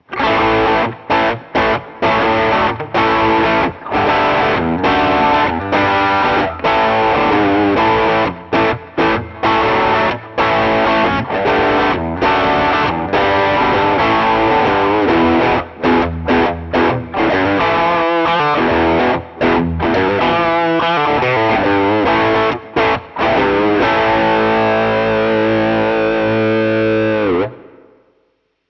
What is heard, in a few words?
Overdrive; Scuffham Amps S-Gear Overdrive Demo